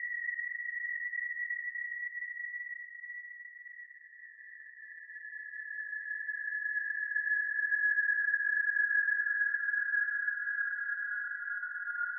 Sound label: Retro Ambience Acute